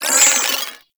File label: Flare Impact; Warframe; Sentients; Sentient Trooper